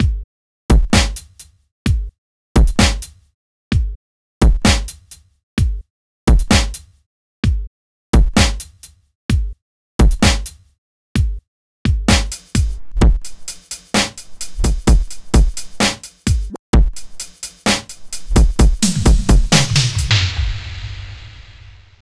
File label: drumsss; 129BPM; Pony Fan Music Remix Stems